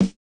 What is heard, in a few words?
snare, snare house